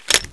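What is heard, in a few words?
double barrel foley, weapons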